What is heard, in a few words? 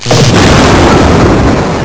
warhammer